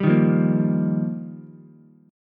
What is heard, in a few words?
G Minor 7th Sharp 5th, Ukulele; Gm7#5; strummed; JGuitar